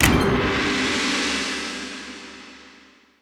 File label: Start Level; Shadow Bug